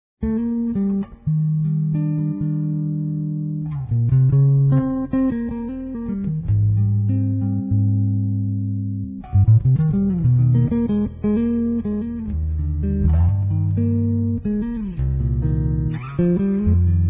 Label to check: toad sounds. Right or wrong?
Wrong